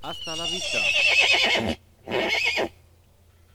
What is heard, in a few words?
horseman die; megaglest